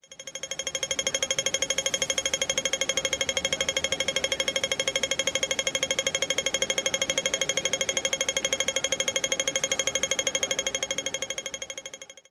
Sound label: pedestrian crossing hongkong